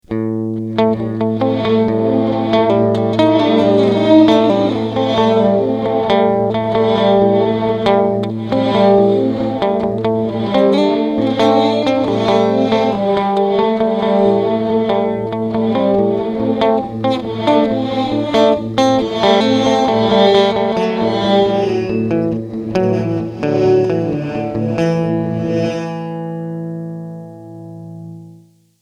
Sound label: Reverse